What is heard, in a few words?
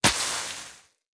Attack Spittle Damage; SFX Fight; Mighty Party; Android